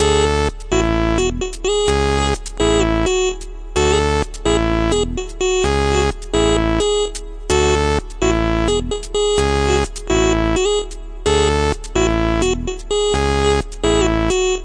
128 glissfull blissfull hard synth melody; SYNTH AND ELECTRONIC LOOPS

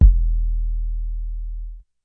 urbantrap kick; Hip Hop; Urban Trap Kit; style kits

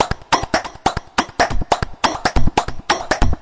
140 bpm hexbeat

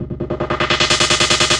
150 rolling filtersnare; 141 to 160 bpm

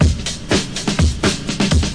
deeploresfunk, drum loops and breaks

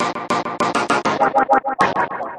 100 echoing funk synth; SYNTH AND ELECTRONIC LOOPS

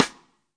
real snare; REAL LIVE KITS

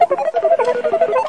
moving; UNUSUAL KITS and FX; R2D2 Construction Kit

